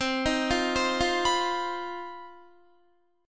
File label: C Minor Major 7th Double Flat 5th C down Guitar 4F 4A 5C 5G 5C 6E; arpeggiated; JGuitar